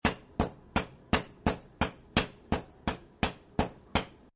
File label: Blacksmith, Factory; Factories; Backgrounds; Blacksmith 2